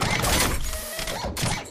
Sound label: combat walk stumble; Titanfall 2